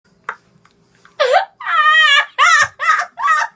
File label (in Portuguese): risada ahahahha